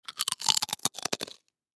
Human Bite Food; Bite Food Chip Big Crunch; Eating; Sound Effects:Human

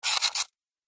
Sci Fi Factory; Production Element 3

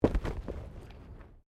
Body movement Impact Roll

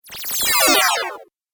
Teleport Warp Video Game

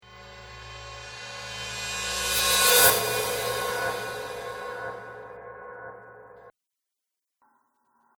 Reverse Cymbal Whoosh

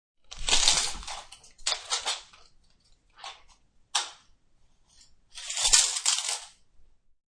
Tape Measure, Tape measure being used; Hand Tools

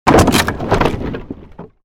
created wooden barrier smash medium; Series of dramatic events based on wooden smashes and collapses; Smash Sound Effects

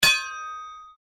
Strike Metal On Metal Heavy Ring; Foley sounds for melee weapons; Materials

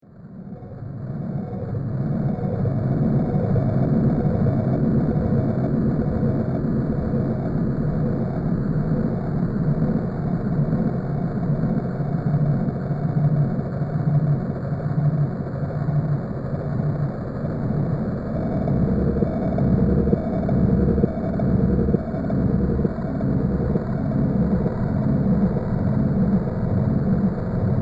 Drone The Planet Surface; science fiction sound effect; Drone Sound Effects; star wars / trek style games

